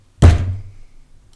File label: Slam On Desk